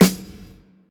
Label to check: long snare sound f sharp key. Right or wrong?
Right